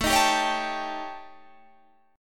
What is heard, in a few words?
Eb 7th Flat 5th A, guitar